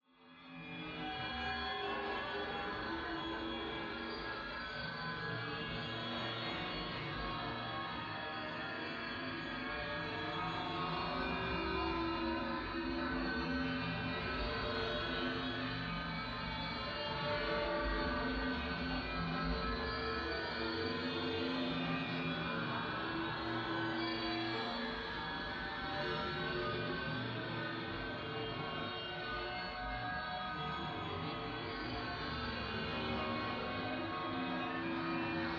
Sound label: AMBIENCE Undiscovered Portal; boom library; Matter